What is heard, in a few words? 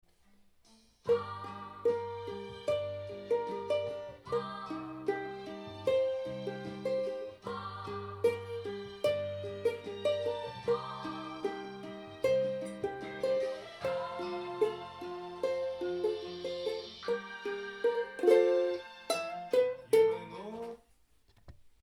ukulele